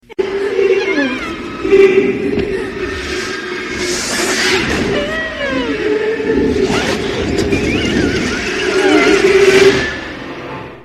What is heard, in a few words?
scary